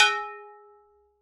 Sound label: dish R